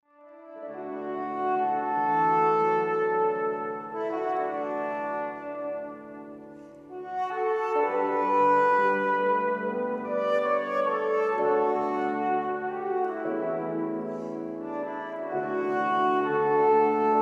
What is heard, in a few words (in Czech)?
fletna klavir